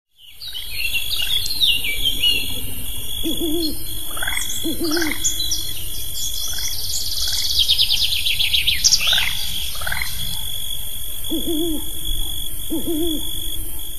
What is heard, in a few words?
Summer Night